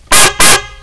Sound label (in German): hupe; audi100